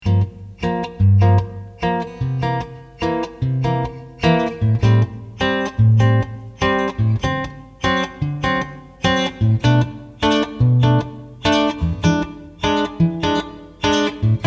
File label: II V 1 note up starting on Gm7; Approachnote exercises